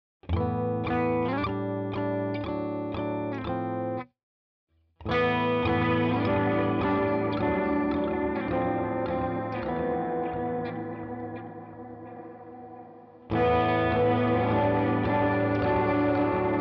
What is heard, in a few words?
DelVerb Analog Mod Spring; UAD